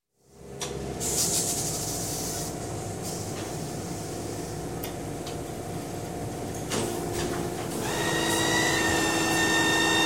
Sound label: Dry Cleaning Plant Steam Equipment, Factory; Industry; Sound Ideas 2000 Series